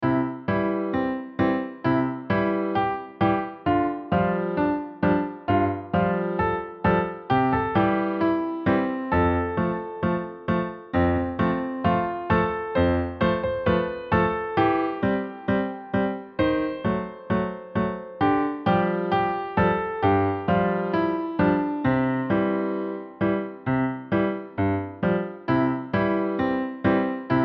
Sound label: slow